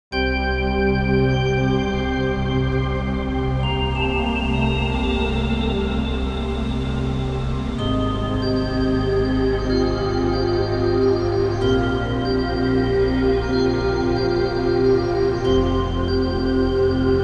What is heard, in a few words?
TEXTURE Minimal tonal